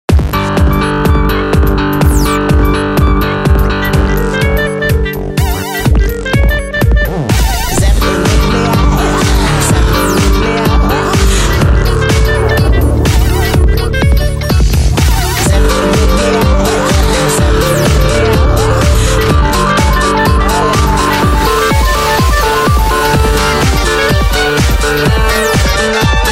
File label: nokia ringmix